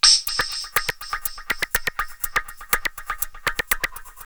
Warm Wet 122, drumloops, DrumMachines; X-Static Goldmine

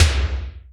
FX Tom, Drum Machines Roland R-5

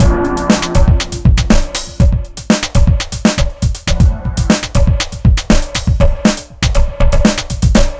akeybreak 120bpm

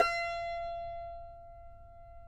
CUMBUS F, Guitars; Spectrasonics; Hans Zimmer Guitars VOL-2

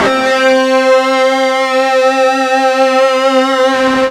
FUZZ C 3, ULTRA FUZZ, Guitar; East West; Steve Stevens Guitar Samples Collection

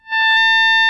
GTR, GUITAR FX

